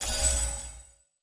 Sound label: Sound Item Use Recovery